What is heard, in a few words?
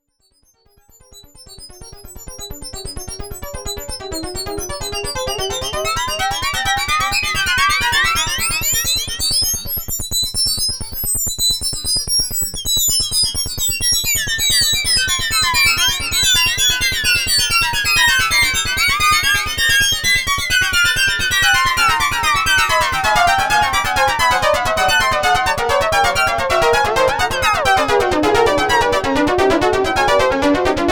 Roland Jupiter6 Strange Things